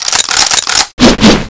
colt reload; svencoop; scshogo

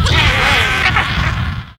condor attack; npc; svencoop; morass beta

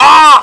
pain; svencoop; scshogo; ucatrooper

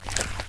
munch; svencoop

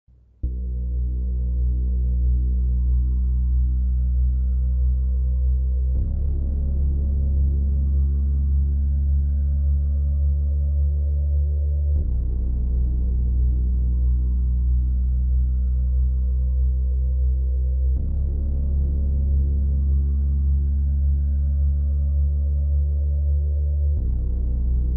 music; svencoop; sence; mistake coop

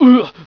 Take damage; svencoop; owen

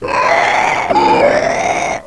stalker alert; svencoop; echoes; dweller

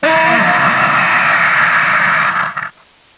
zombie attack; svencoop

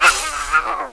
death; svencoop

